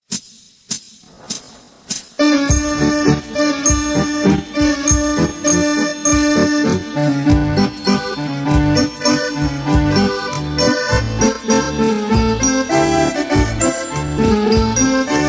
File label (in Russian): Polka Orkestr